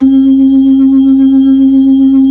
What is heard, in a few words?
B-3, Blues